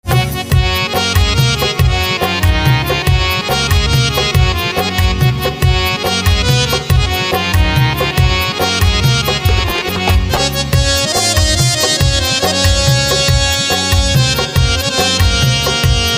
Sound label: Harmonium